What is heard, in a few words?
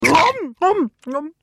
Kingpig Chew; bad piggies; android; mobile; windows